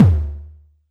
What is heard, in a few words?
Tom Low